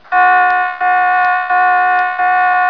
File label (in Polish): Dźwięk Ostrzegawczy